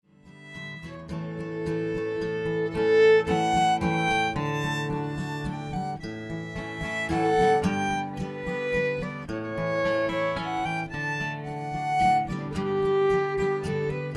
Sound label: waltz, fiddle, guitar; A sweet waltz; composition by Scottish fiddler